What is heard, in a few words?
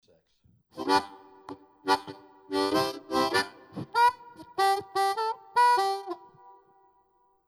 Long hall reverb